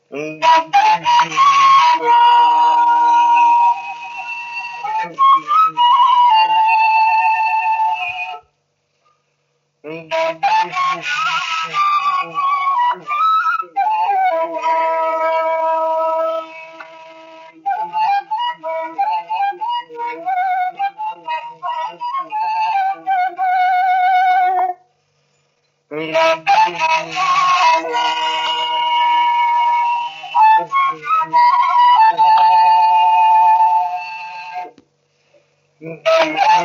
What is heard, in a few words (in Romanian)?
Dor de padure fluier; Vaslui